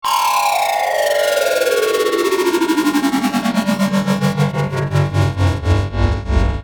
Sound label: Swirlpad Modulator